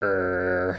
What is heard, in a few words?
Dragon Emote